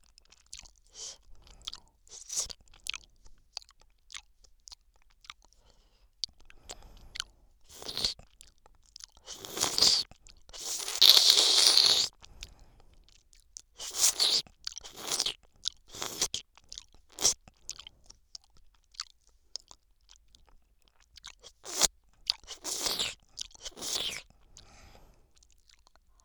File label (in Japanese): 左耳 アイス 舐め